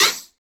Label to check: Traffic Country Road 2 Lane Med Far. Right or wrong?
Wrong